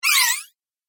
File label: Whoosh Sharp Swipe